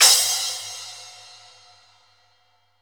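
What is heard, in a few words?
crash, GM drum set; AKAI S-Series CD-ROM Sound Library VOL-8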